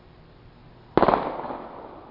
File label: 4 rounds full auto